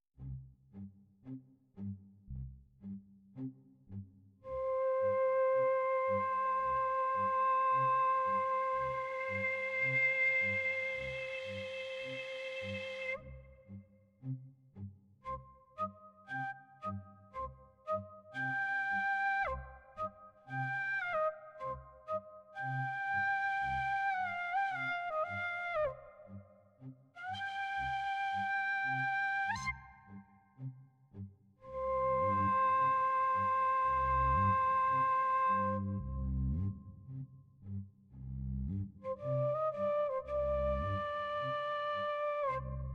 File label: OSMOSE Yokobue; EXPRESSIVE E